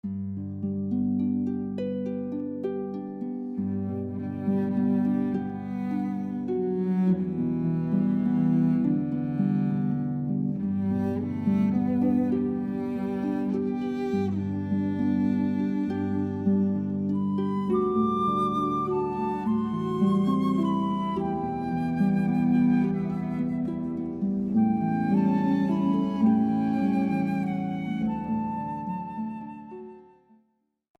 O Mio Babbino Caro, Puccini, harp flute cello

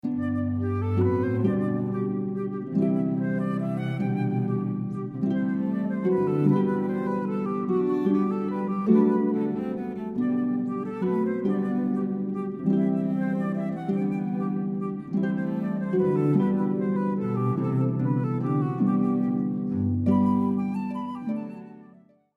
Minuet, Bach, harp flute cello

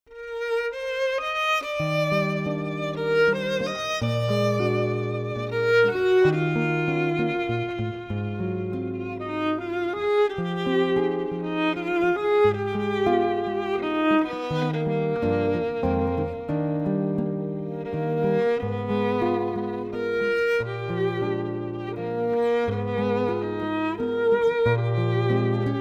Duo of Guitar & Violin

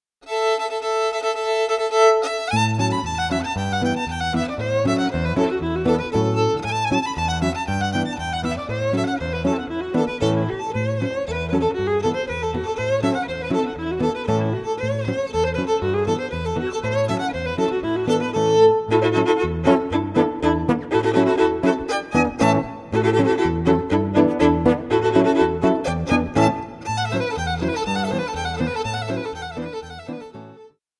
Duo of Guitar; guitar violin; Lyrica Demo

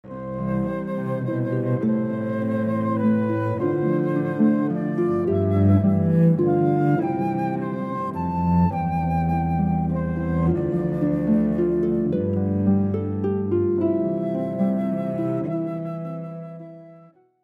harp flute cello

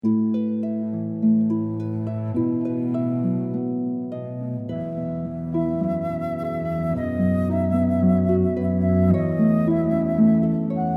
harp flute cello

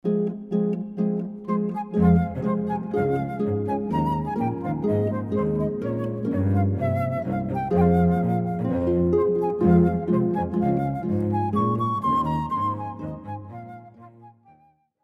Bashana, traditional Jewish, harp flute cello

